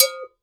GANKOKWE, Drums; Drums&Percussion; Gankokwe Bells; E-MU Producer Series Vol. 7 – Old World Instruments

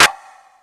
Beat Box, E-MU Pro-Cussion

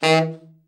TENOR SN, Sax; TENOR SHORT; Giga Samples Collection

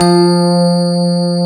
GRAND PF F 2, AKAI S-Series

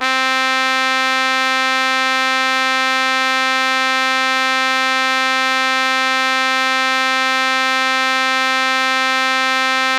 Trb, misc voices

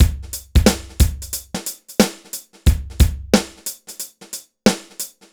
BPM 90 DRY

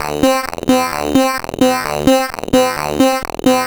MiniBrute Loop, 130BPM

